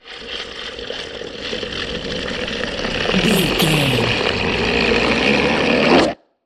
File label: troll monster growl slow